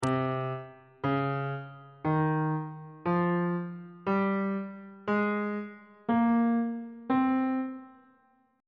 c phrygian mode on bass clef

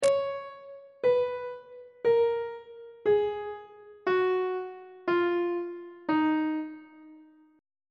d sharp minor scale descending on treble clef

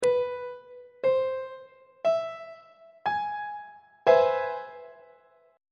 d double flat augmented major 7th 3rd inversion; treble clef

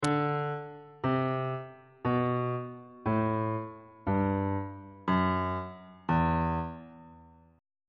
e minor scale descending on bass clef

